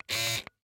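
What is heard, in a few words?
sounds of hair clipper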